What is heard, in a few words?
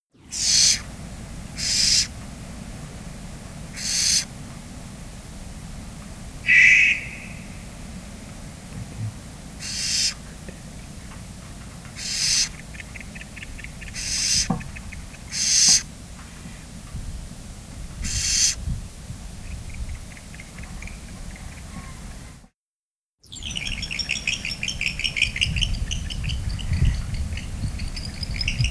Barn Owl